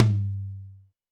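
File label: Alesis D4 Tom Lo Double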